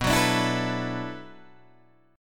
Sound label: B-Minor 11th B down Guitar Open D